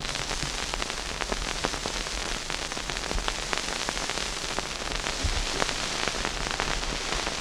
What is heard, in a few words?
Vinyl Old 78, Noise; layering